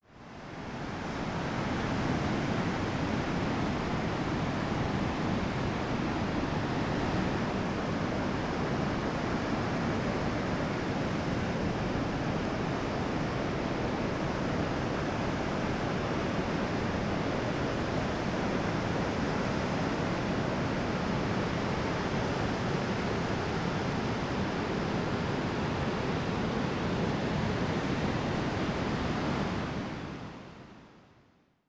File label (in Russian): shum silnogo vetra